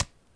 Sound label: card select; balatro-gba